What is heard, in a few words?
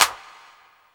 CLAP, Dance Drums